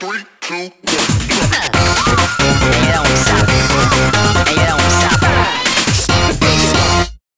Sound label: Brass Rush; DJ Professor K Presents 24/7 Funky Fresh Beats From Tokyo-to